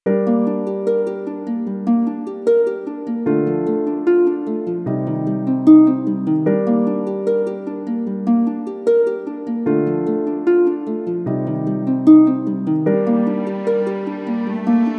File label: dramatic drum; by burak sound bank; dramatic dram vol 15